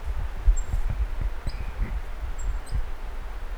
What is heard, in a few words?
Calls C & B, titi monkeys; vocalisations; compcog